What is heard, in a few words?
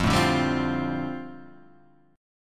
F 6th Add 9th F down, chord; F6add9 strummed